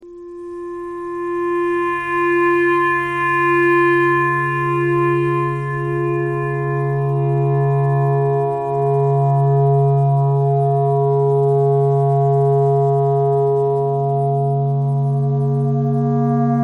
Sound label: clarinet clarinet pentatonic